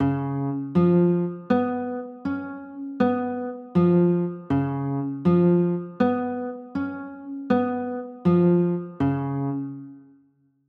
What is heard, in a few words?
c sharp 6 9, chords; c sharp 7